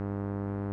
Electric-Box Hum; viewfinder

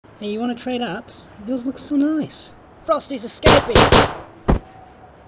Costume; The Henry Stickmin Collection

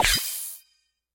Power Rainbow Used

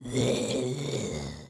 Enemy Bumbkin Idle

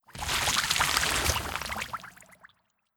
Skel Scientist Vomit

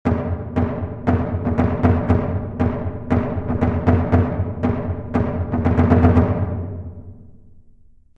Summon drum

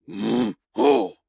Ruins Great Shield Sentry Attack; Ruins Great Shield Sentry Attack 01

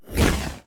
Void Fireball

